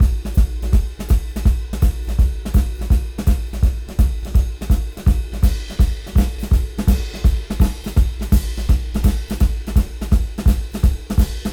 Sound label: Swing Beat 165 BPM; AMG - Keith LeBlanc Phat Butt Jams